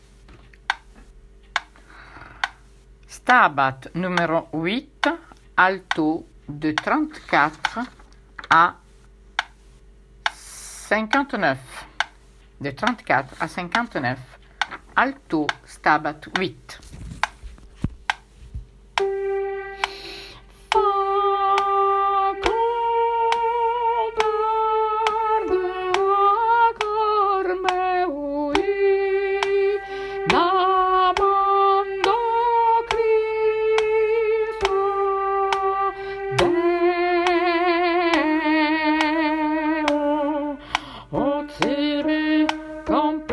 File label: Stabat Alto; Mesures 34 à 59